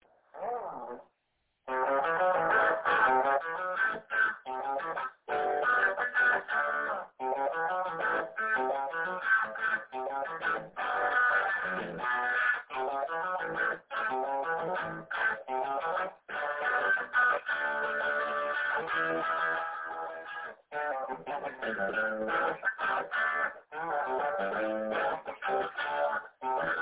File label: southern modern pop rock